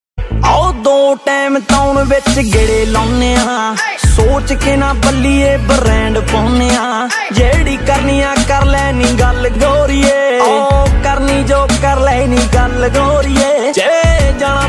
Desi Punjabi Ringtone

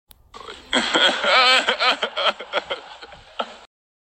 Laugh Ringtone; Kawhi Leonard

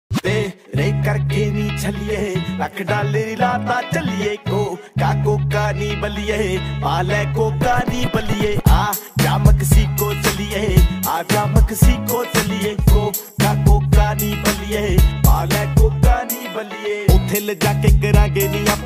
Hood Punjabi Ringtone

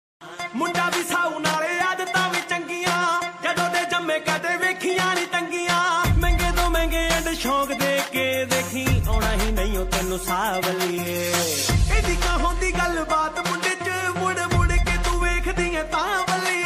Desi Punjabi Ringtone

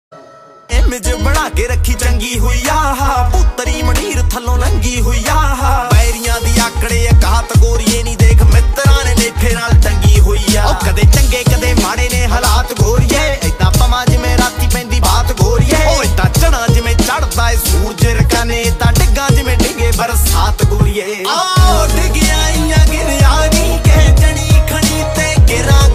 Punjabi Beats Ringtone

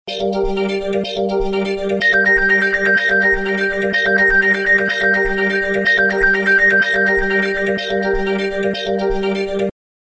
Sms Tone Ringtone

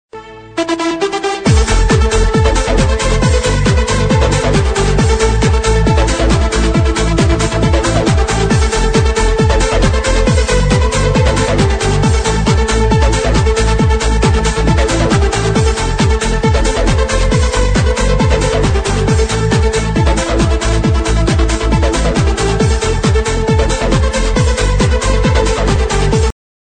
Techno Ringtone

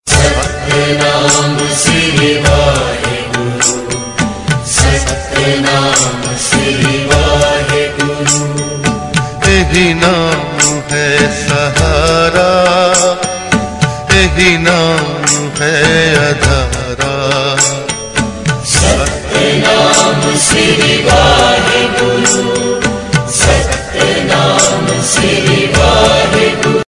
Satnam Sri Waheguru Ringtone

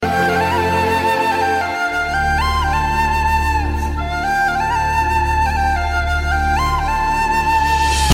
Flute; Athidi

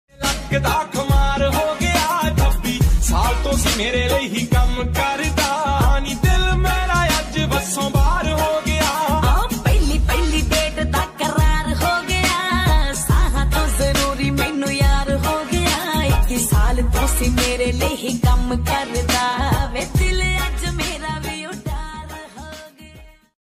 Trending Punjabi Ringtone

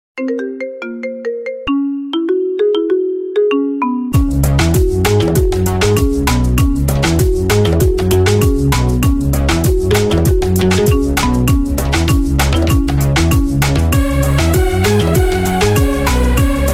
Marimba Ringtone

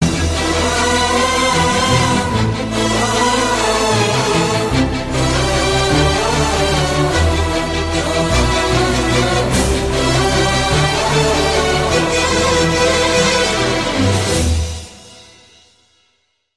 BGM Telugu Ringtone; Pournami

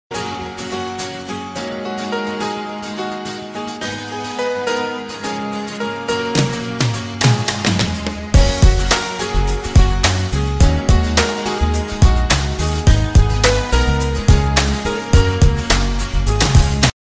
Instrumental

